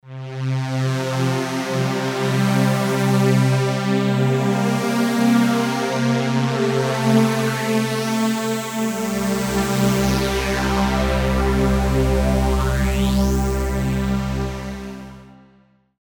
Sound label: Hydrasynth; phaezra2